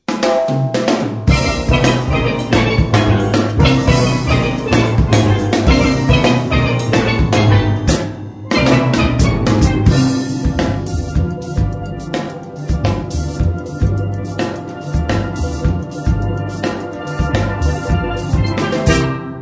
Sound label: jazz fusion on steel